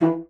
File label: E3, bassoon; Xsample VOL-6; C BASSOON